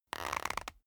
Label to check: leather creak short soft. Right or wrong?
Right